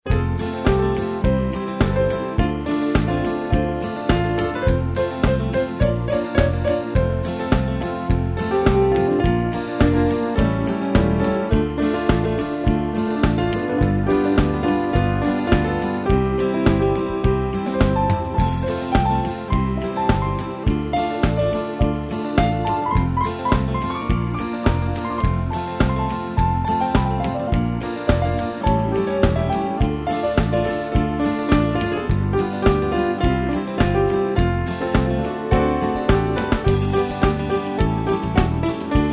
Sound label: Country medley